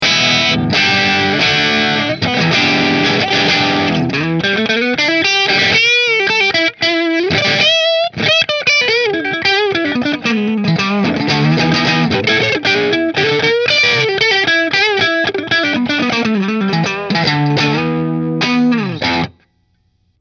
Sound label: Middle Marshall; Orville